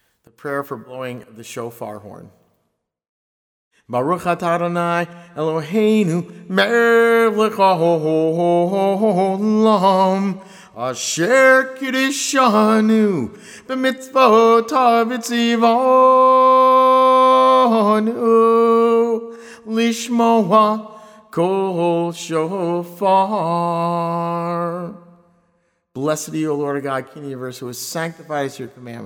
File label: Shofar Blessing